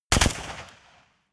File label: rifle ak-47 exterior fire double light; comedy-cartoon; Pistols and guns; pistolen-gewehre